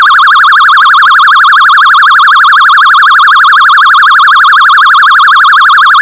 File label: gun